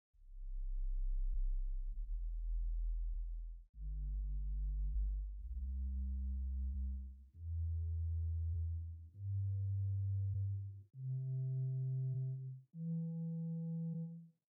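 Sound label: Pedal Echo Bass 16 arpeggio, organ; St Annes Moseley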